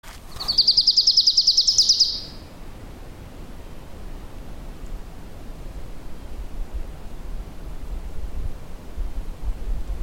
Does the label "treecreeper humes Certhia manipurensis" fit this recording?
Yes